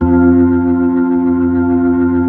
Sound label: B-3